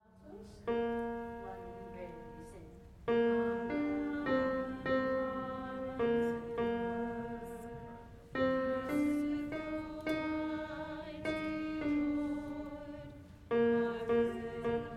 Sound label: Come Now Almighty King alto